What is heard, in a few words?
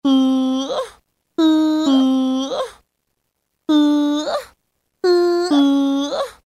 ooo; Roland M-DC1